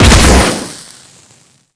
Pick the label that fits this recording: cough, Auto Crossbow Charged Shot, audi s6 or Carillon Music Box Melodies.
Auto Crossbow Charged Shot